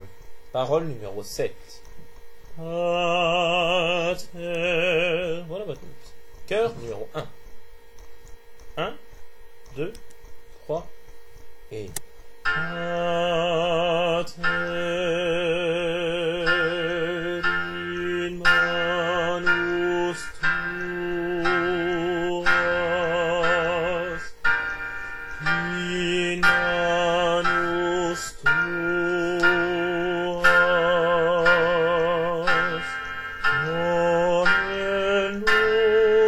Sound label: bass